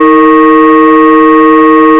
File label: fm voice single; FFTimbre